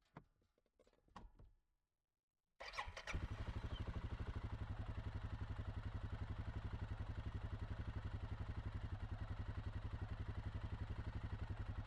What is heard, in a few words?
Suzuki 750AXI ONBRD SLOW Start Drive Stop Reverse Stop Drive Stop Off ENGINE, Suzuki KingQuad 750AXI; 2017; MKH8020